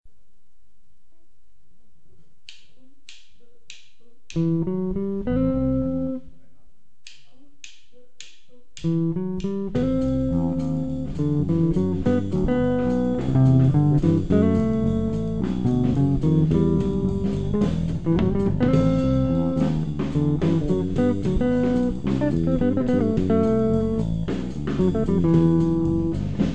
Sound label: guitar